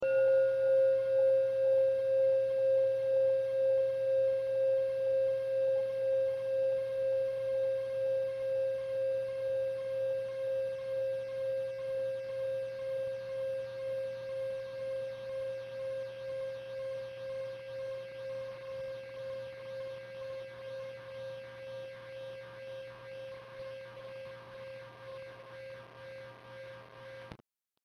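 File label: Dual Fundamentals 2 inch Aluminum, chime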